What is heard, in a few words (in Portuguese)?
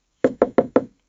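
machado batendo na madeira; wood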